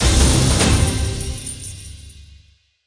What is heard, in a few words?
big Win End; jumanji